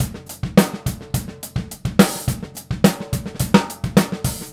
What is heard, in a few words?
Straight Kit, World Pop Beats